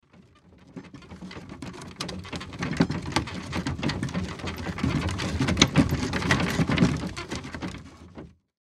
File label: Wagon Wood Creaks, Wagon Creaks Wheel; Carriage Sound Effects; Vehicles; Foley; SoundEffects-Blastwave